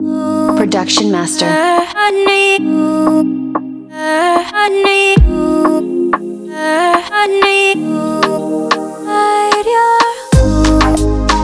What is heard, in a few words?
Dreamy Reggaeton; Production Master; La Sirena